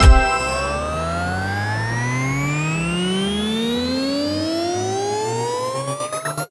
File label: stop bonus; Amatic; Book of Fruits